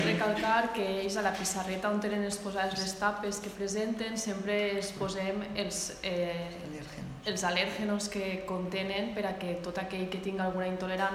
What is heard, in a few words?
Tapes